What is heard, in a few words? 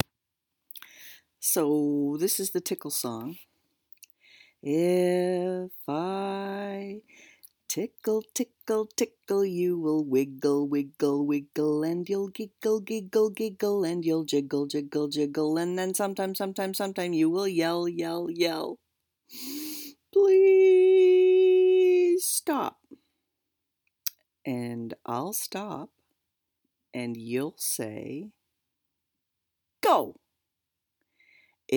Tickle song